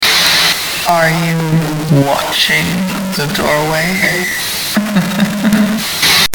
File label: spooky sound